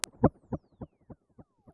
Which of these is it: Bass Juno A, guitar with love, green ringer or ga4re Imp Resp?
ga4re Imp Resp